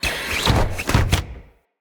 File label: Balloon Release Player; Encounters